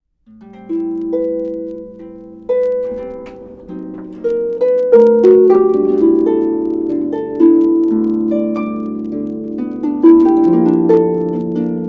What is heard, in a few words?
Harp